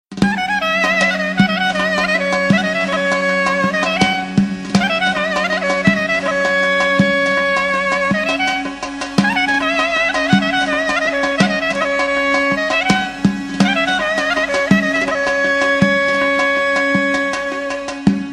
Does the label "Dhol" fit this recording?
Yes